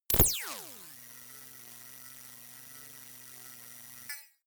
laser welder long; soundpool

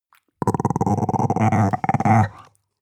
chihuahua growl stutter; natur-tiere; hunde

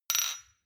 shot glass clink bounce medium; soundpool

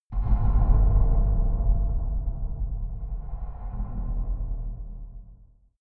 far dark metal rumble, hits impacts

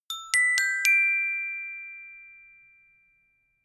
notification glockenspiel dry sequence jump

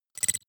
noise flick splutter; maschinen; signale; soundpool

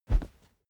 whoosh cloth soft dirty; swooshes-swishes

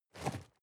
cardboard box medium shake single; objekte

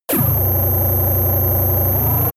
beam charged; energie

